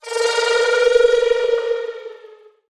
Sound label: Reverberant Squealing Flutter